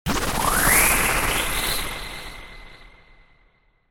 Lift Off Whoosh, Multimedia futuristic lift off whoosh.; Whooshes; Sound Design